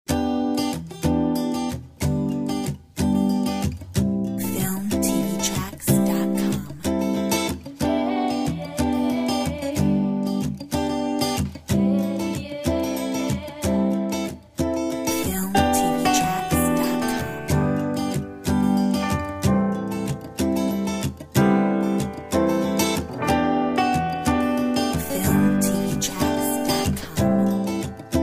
Cafe Relax

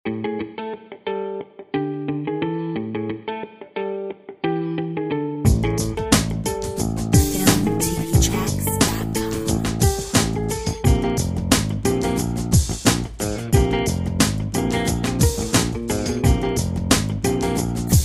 Radio funk

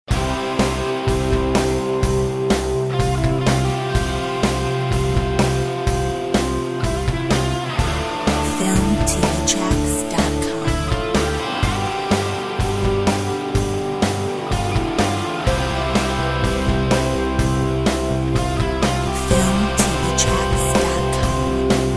Unplugged

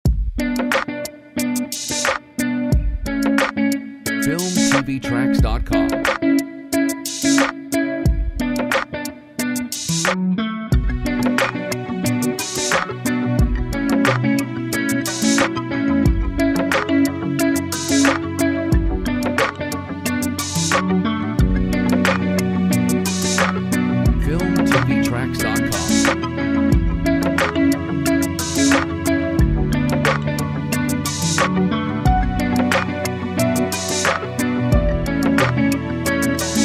Clap again

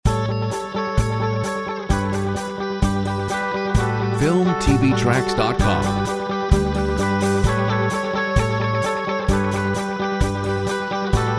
Rock Stellar

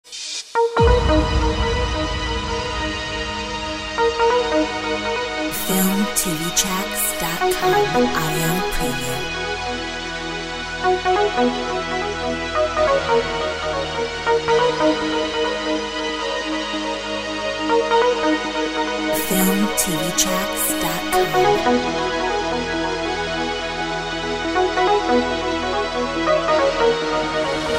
trance

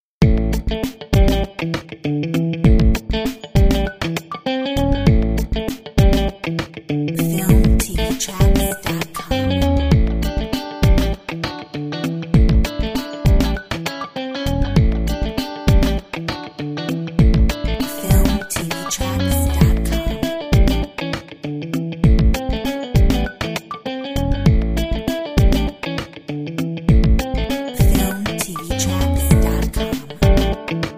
funk

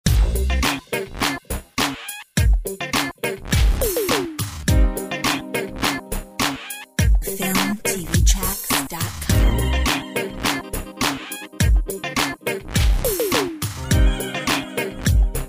Old School funk; Filmtv-tracks

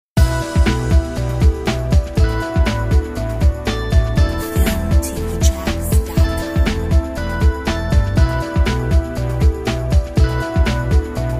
Corporate force All cuts

